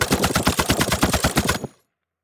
blaster, Cartoon Film Effects

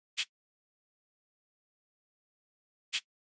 Drum Loop 98 BPM Shaker; Loop Stems; Cymatics; Vibes II Drum Loop Collection

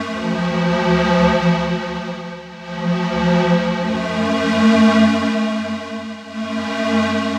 Pad Loop 130 F, Techno; Loops; Pads; Ghosthack; Free Techno Sounds 2024

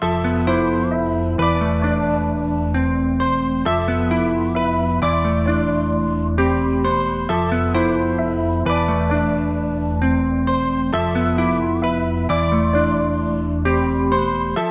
132 BPM F Min, Hip Hop Melodies, Sad; Life

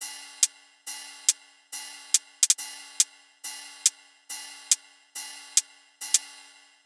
Riddim Drum Loop Top 140; Drum Loops; Ghosthack; Riddim Essentials 2020

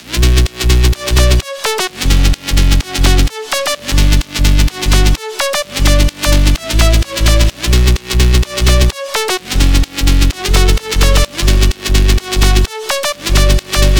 128 BPM B Min Sidechained, House; Drop Loops; Cymatics; Believer

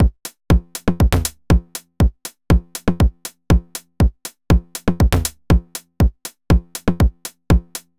Loop Drums 120, Deep House; Mutation; Ghosthack; Advent Calendar 2019

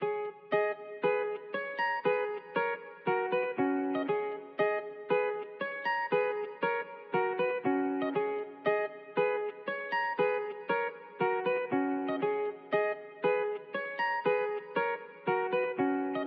118 BPM G# Min Guitar Chords; Melody; Trap; Loop Stems; Cymatics; 2022 Melody Collection - Trap Pt 1; Great Escape

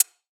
Closed Hihat; Drums - One Shots; Cymbals; Cymatics - 9God Hip Hop Sample Pack

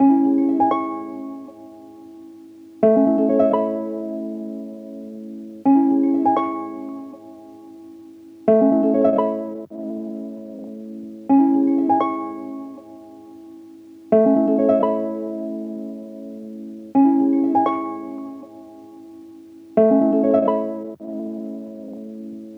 Harp Loop Dry 85 BPM G# Min; Melodics; Cymatics - Eternity Sample Pack

